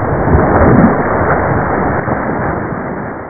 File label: Splash; avanim